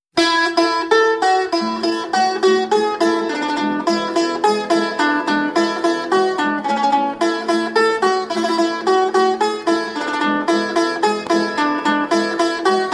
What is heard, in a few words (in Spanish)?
Jota de los novillos Medina del Campo